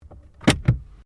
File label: Car Ford Focus Glovebox Close; Ford Focus hire car; Cars; Vehicles